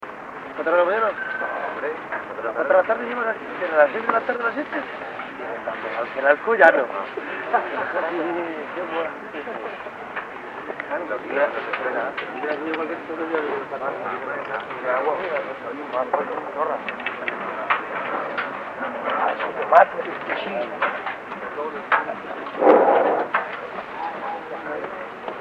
shrine dictorphone mexico, street recording

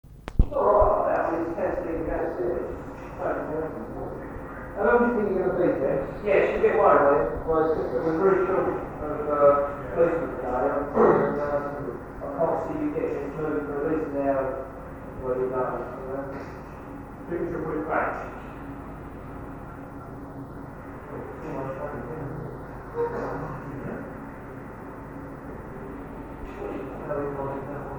shrine dictorphone mexico, street recording

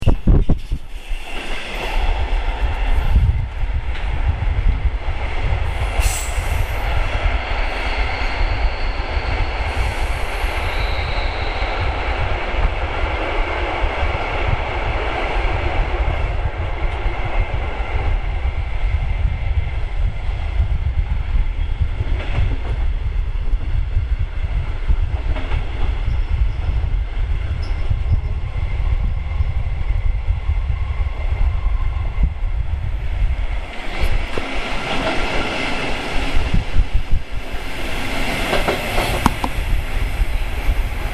train germany

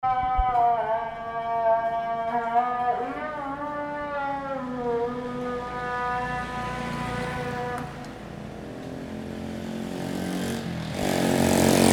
mosque night street, Indonesia

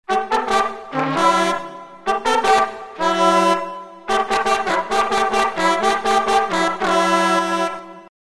fanfare trad long

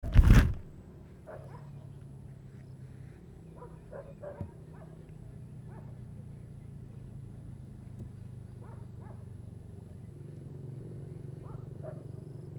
village ambience motorbike, Vietnam; 2019

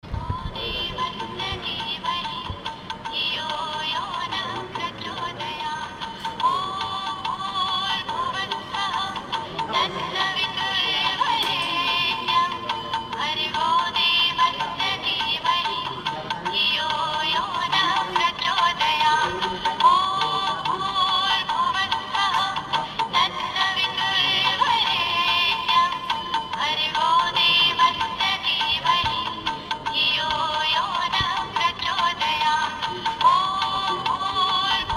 Music Om, North India

